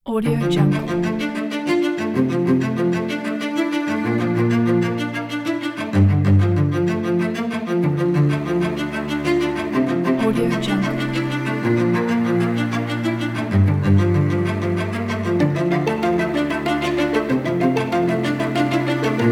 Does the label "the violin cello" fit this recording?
Yes